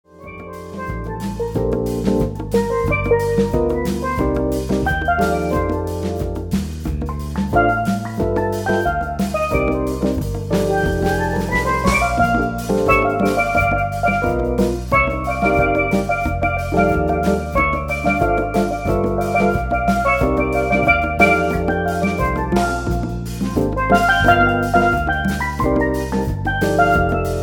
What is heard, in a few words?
quintet